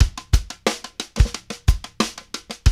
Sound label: TICK, Funky Drums; AMG; Now CD-ROM (Roland); NOW from HELL!